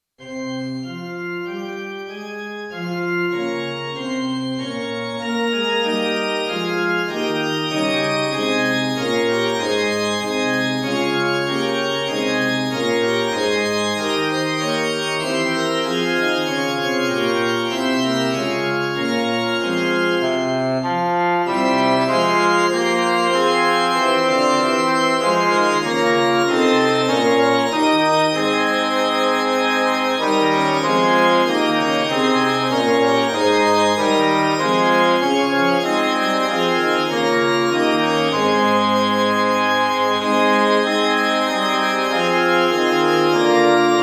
Fl Fl Eh Fg Organ, Tonality：f (♭♭♭♭) Tempo：Quarter note = 96